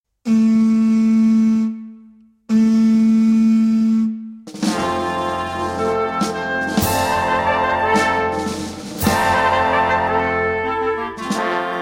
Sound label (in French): harmonie et fanfare version courte